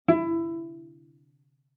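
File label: Cello pizz fortissimo sul A E4; MIS Pitches - 2014; Strings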